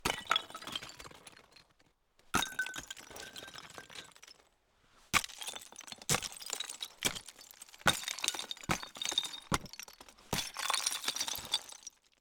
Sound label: Breaking Icicles